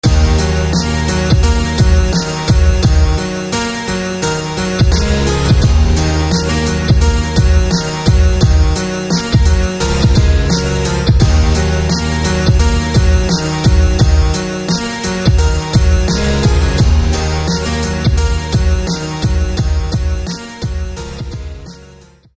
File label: Grand Harpsichord